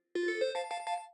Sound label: winning Sound